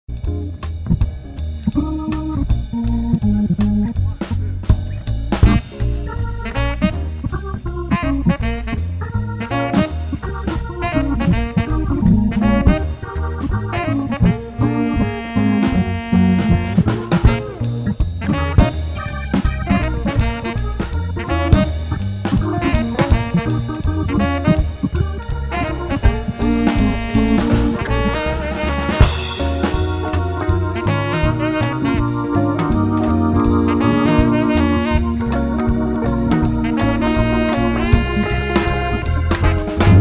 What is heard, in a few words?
Jazz, Live